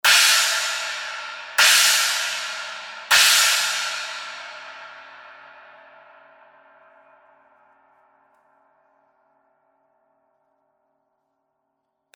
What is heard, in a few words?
21 zildjian ultra hammered china brilliant